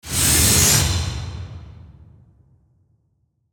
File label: Whoosh Mechanical, Whoosh Mechanical Pneumatic Hit; Blastwave